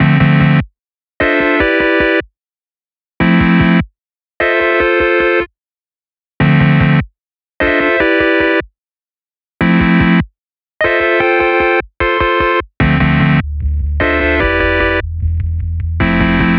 songstarter 150 Am, Pop; Slate; SD PASADENA Pop Sample Pack

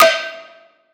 snare rail; one shots; SEISMIC Drum Sample Pack; Slate

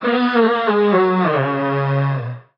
vocal B; glowing; SD AURORA Sample Pack; Slate

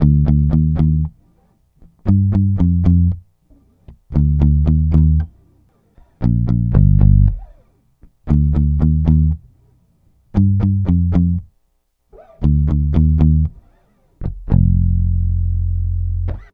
Bass loop 116 Bb, Electric Bass Loops Direct; Slate Digital BASSHOLE Sample Pack

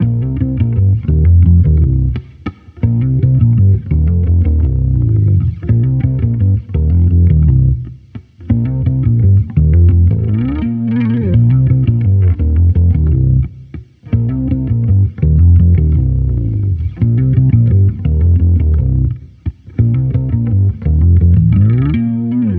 Amped loop 85 Cm, Electric Bass Loops; Slate Digital BASSHOLE Sample Pack

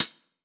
Closed Hat Spacey, 909; Slate; Vintage Drum Machines Volume 3 909 Edition

